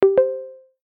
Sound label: message